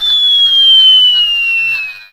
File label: bee Crash